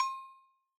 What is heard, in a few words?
Agogo L; Yamaha DJX-2B